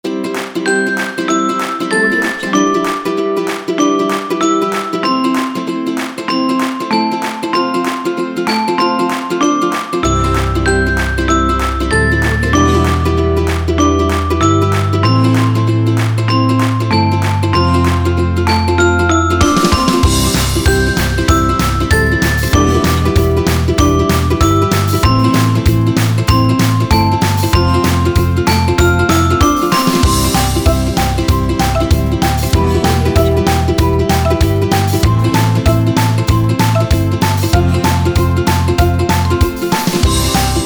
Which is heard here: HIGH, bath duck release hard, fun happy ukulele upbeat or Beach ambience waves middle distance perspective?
fun happy ukulele upbeat